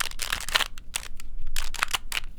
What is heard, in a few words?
Small Cube - Twist, Rubik's Cube